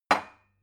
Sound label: glass put down cupboard hard